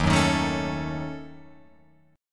Eb Minor 7th Flat 5th, Guitar, Open F; Ebm7b5; strummed; JGuitar

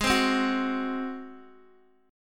Ab 7th Ab down Guitar Open D

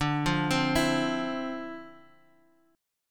D Minor 9th, down

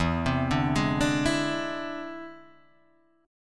E Augmented 7th E down Guitar Drop D

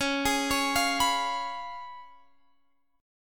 Db 7th Db down Guitar Half Step Down

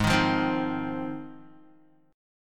G sharp 6th G sharp down Guitar, chord

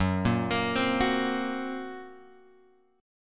F sharp Major Flat 5th F sharp; F#Mb5 Chord {2 1 X 3 1 2} arpeggiated

